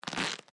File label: table